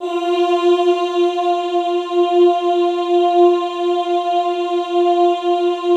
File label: OOH F3, Classical Choir; Best Service; Extended Classical Choir; OOH MIX